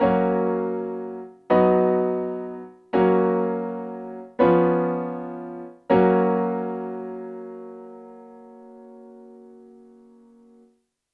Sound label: G flat Major primary chords